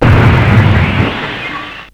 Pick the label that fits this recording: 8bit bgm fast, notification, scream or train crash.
train crash